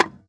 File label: Monster Can, percussion; Houston; Moody Park